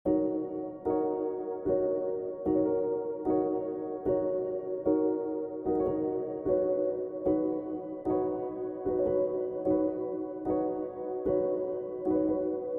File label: loop melodic C